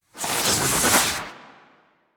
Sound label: Melee Heavy Attack Small Charge, Weapons Melee Whooshes; Warframe